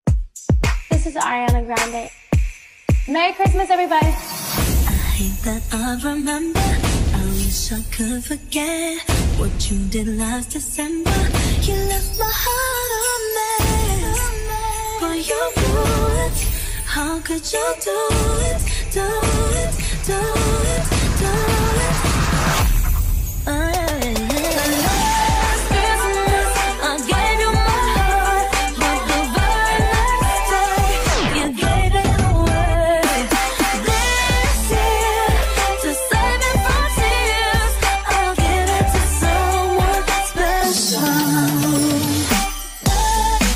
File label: remix dance